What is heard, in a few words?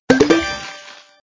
Box blow